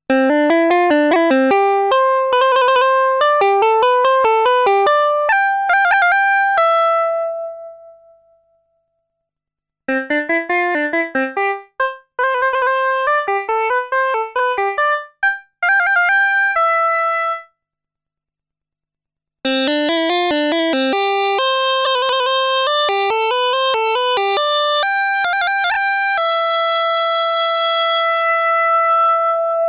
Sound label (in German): Env Umschaltung